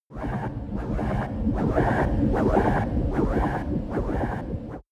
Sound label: space passing defect fighting racer; raumschiff